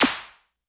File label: Clap Laser; Lost Tapes From Mars; Clap & Rim